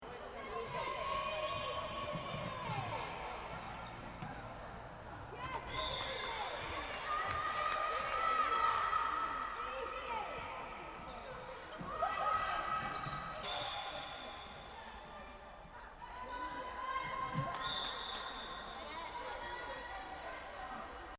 Interior netball, Sports Hall, Interior: Ambience, Netball Game, Reverberant; Backgrounds; Stuart Duffield